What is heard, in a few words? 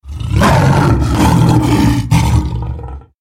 lion roaring